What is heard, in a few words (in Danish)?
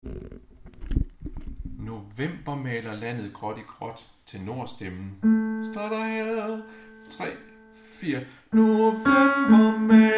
tenor, harlev koret